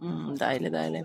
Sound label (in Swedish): Skrik